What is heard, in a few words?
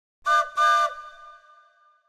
Train whistle